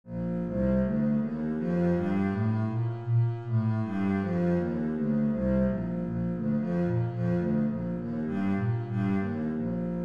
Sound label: bass B flat major